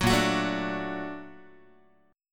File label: Bb Augmented Major 7th Bb, Guitar; Bb+M7 strummed; JGuitar